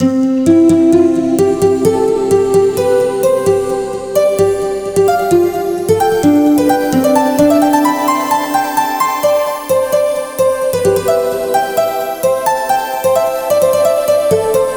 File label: Harp End Build